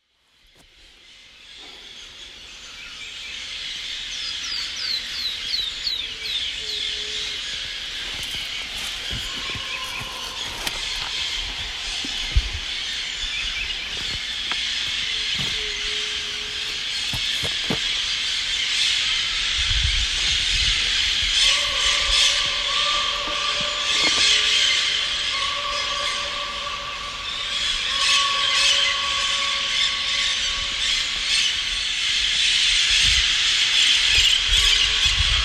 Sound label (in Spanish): Aves en el sotano de las huahuas